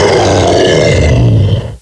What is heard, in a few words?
Pound Death